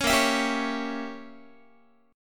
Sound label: A sharp Diminished 7th Guitar; A#dim7; strummed; 16,18,19,17,17,x